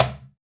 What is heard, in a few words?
Cajon Hi, Percussion; Roland BK-5